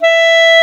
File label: clarinet E 5; East West; Global Sounds